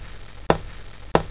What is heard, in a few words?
Step